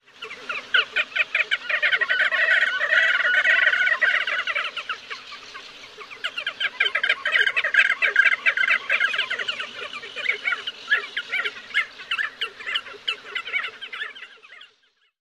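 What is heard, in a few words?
Crested Auklet calls at colony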